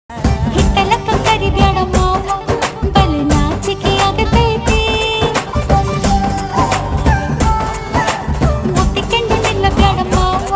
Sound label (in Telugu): song telugu music dance folk